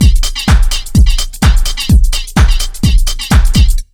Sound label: BEAT, Disco House; USB Soundscan vol.20; AKAI